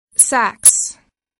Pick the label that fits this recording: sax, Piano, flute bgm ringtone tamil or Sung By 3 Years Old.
sax